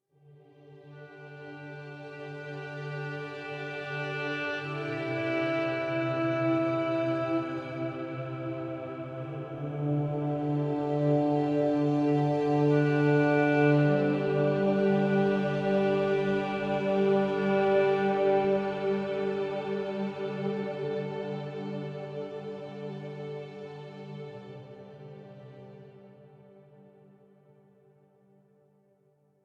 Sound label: Flute and Strings; Grand Ages Medieval